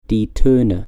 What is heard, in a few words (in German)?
Toene